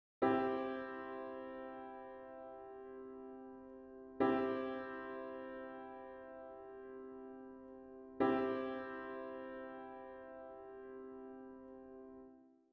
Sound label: Major Chord